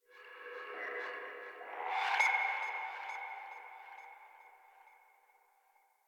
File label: Texture Interior Medium, Ambience; Sentient Lair; Warframe